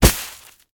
kappa death disappear; smite; kuzenbo

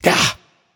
ouch; smite; voicepack; ravana

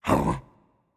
confused, voicepack; smite; jorm

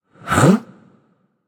confused; cerberus

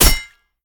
stab hit; smite; lancelot

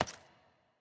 footstep; smite; pele

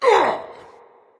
grunt; smite; Vulcan

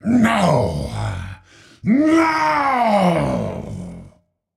death; smite; voicepack; ra

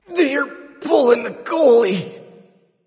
death; smite; nezha

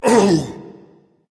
ouch berserker; cuchulainn

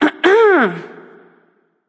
clear throat; smite; voicepack; jingwei

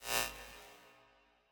footstep trail; smite; khepri

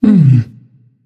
notice; smite; arachne

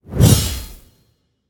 swing metal; Smite; Cabrakan

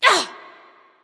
freya grunt

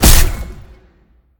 hit; smite; god tiamat

